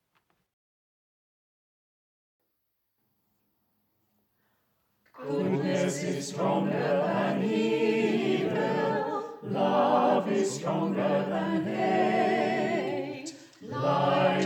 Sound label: Easter Choir Anthem